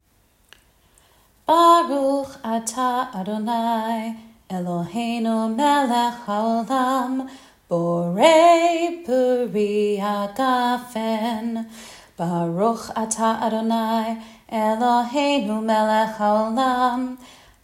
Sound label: Kiddush long; shabbat babayit